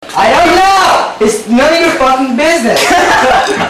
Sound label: cellphone